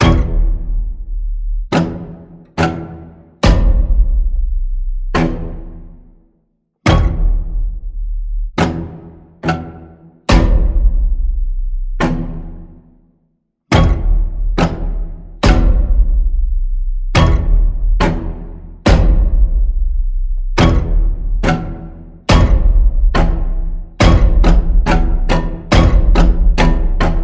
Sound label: String Pulse E, Key of E